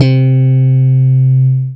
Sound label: Bass Note C; House; Best Service; House Definition VOL-1; Basses